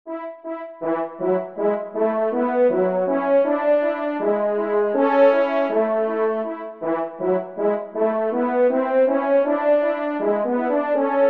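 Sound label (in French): duo, ensemble, trompe cors; Kling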